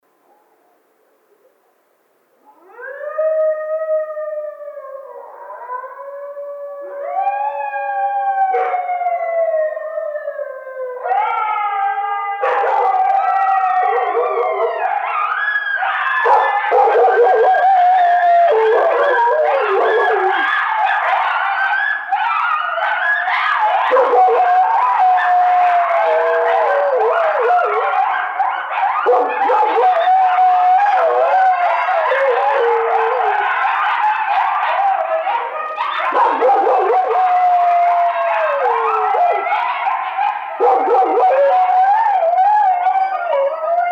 Coyotes; Canis latrans

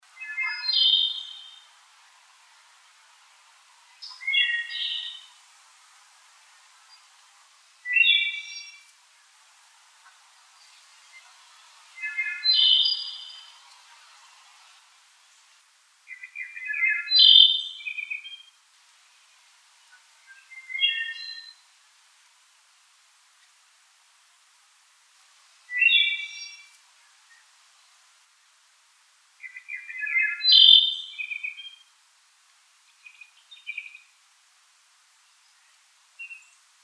Wood Thrush

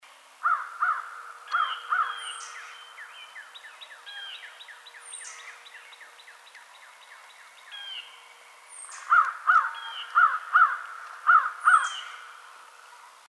American Crow

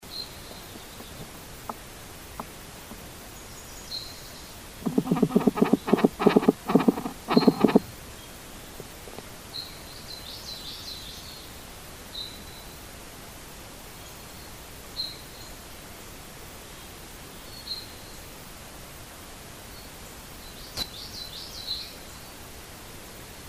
Insect

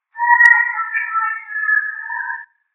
voice, bigfoot

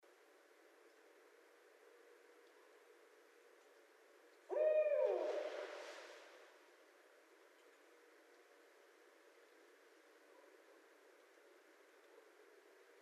Barred Owl

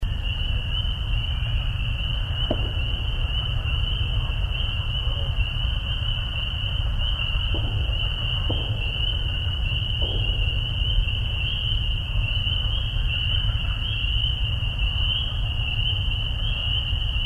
tree knocks

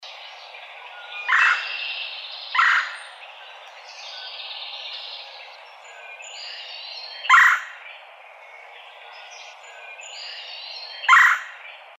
Red-bellied Woodpecker

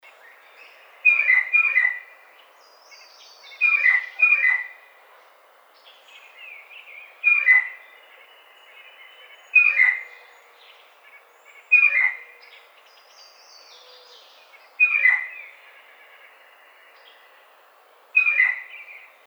Blue Jay

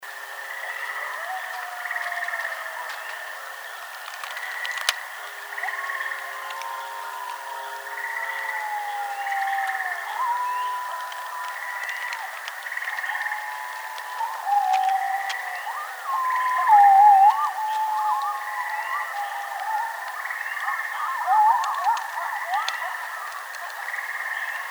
howl, Coyote; Coyotes (Canis latrans)

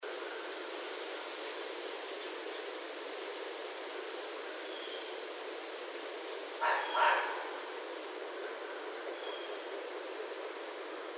Red Fox

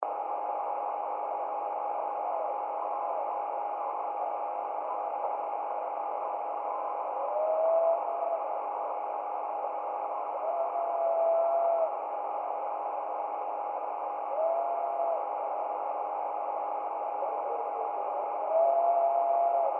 Howl, bigfoot

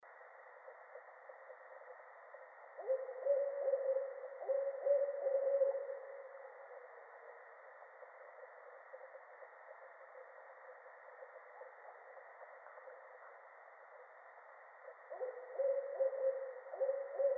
Barred Owl

